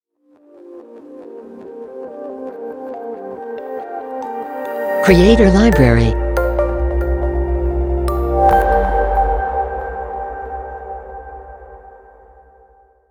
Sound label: Floating Particles Logo